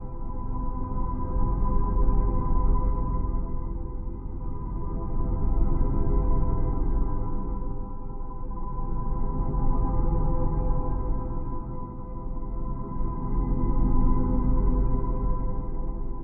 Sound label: vision humm